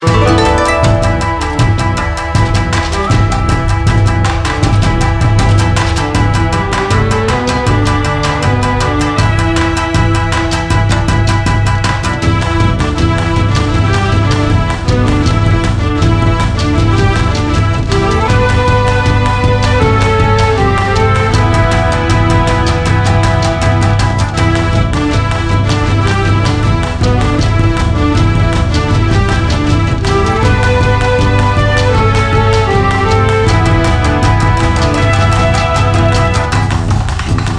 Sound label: BGM STAGE; Shuriken Sentai Ninninger Game de Wasshoi; 3DS